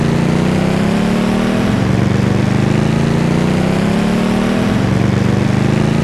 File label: gokart gear2; freeman; vehicles